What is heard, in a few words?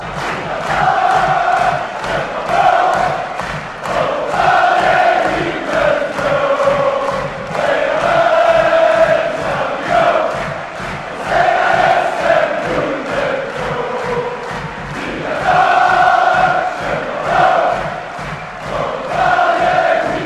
to attack fight on, fanchants